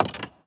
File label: Foot Step; blue archive